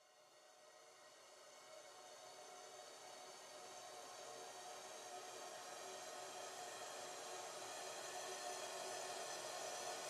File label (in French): le chant de la cuvette